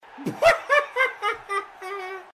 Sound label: pfffhahahaha